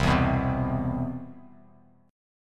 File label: C Major 7th, Bass Standard; CM7 strummed; JGuitar